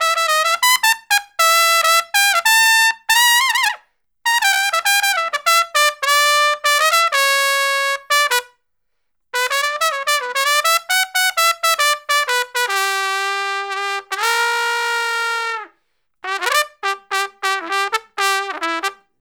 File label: Trumpet Solo, Jazz Traditional